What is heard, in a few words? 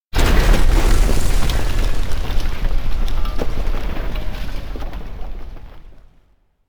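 electronic building collapse slow; anemoi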